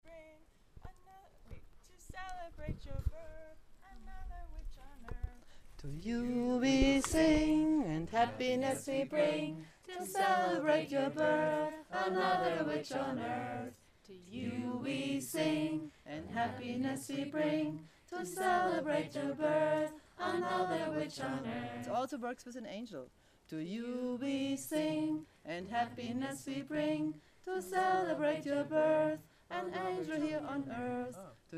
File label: Birthday Song; Reclaiming Witchcamps USA 2009; Singing Path